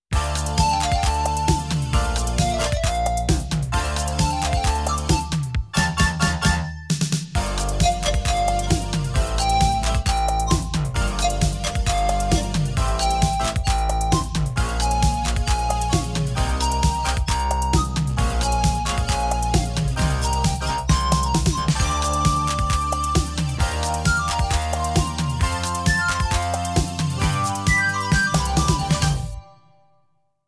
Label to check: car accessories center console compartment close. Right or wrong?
Wrong